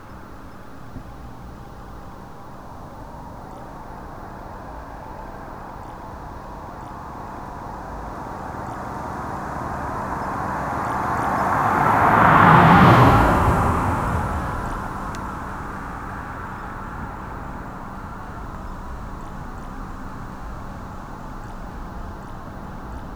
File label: realistic exterior sound